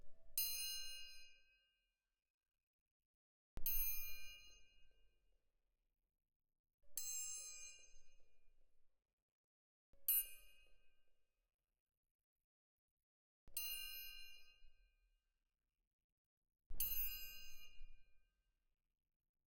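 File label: Percussion, Jazz for piano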